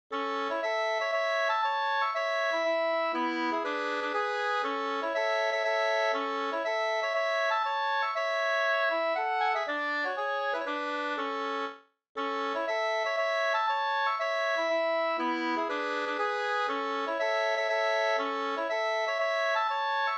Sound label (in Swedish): polska; e fadern e Oskar Haglund Strangnas